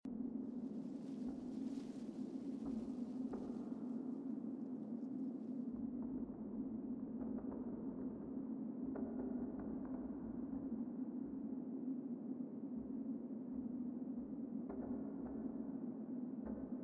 过道背景声

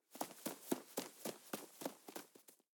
跑走

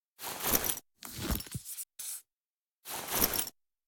打开笔记放入背包

